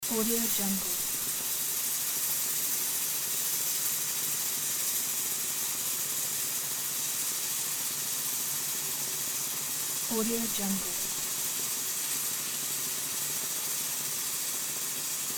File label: pressure cooker